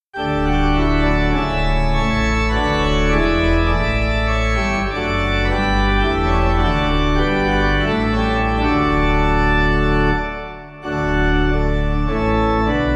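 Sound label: pipe organ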